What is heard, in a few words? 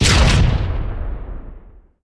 whiplash proxy; Pocket Tanks Deluxe